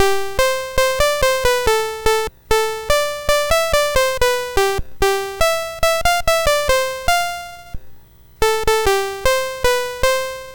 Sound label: Xmas Card BASIC sid MOS6581R2